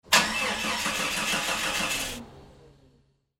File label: car cant start